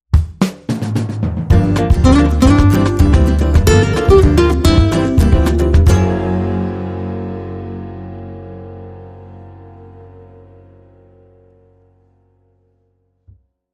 Samba

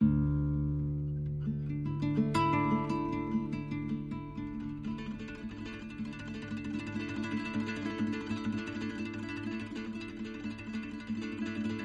No Pads

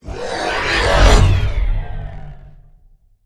Cyclops Behemoth Flyby

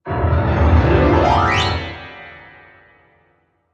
Piano Gestures

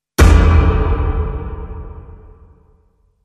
Low Hit, Sting

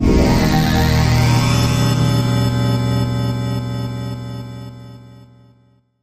Power Flux Oscillating Pulser

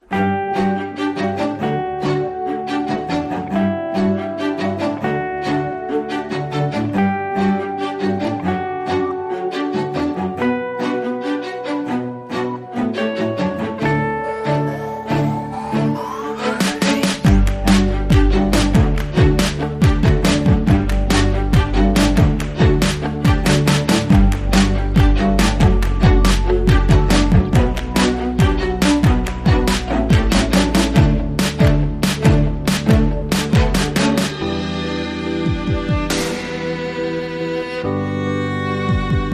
No Percussion and Less Synths

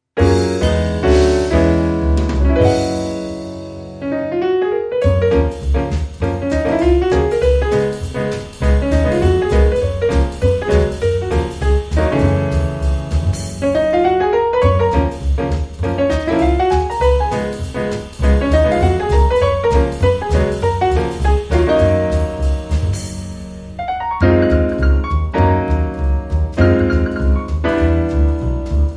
Jazzy Joy

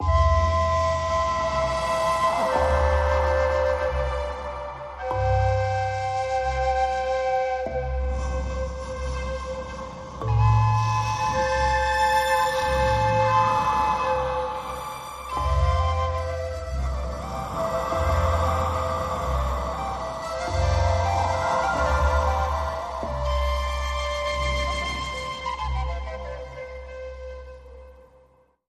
Tremolant

